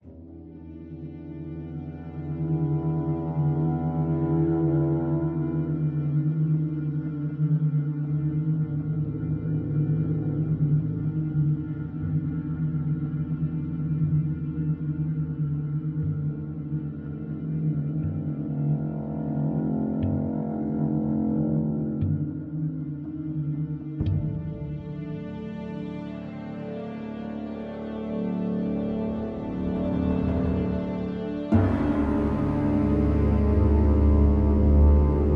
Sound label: Loop Suspense